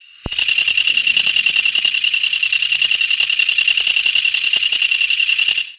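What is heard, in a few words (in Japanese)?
kutuwamushi; kutuwamusi; zukan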